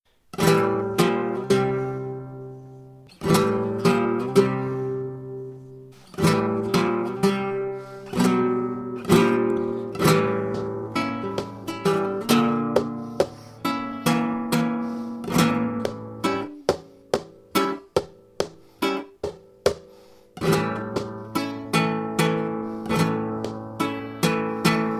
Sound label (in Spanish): Bulerias compases rasgueados, flamenco